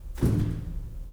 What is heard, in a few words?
bass drum